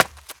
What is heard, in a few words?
run concrete